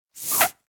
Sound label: Empty gel bottle squeeze